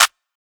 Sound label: clap crack; Hip-Hop; Drum One Shots; SD CAICOS Hip-Hop Sample Pack; Slate